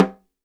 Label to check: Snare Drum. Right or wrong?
Right